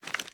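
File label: pistol reload fast xmags raise; gmod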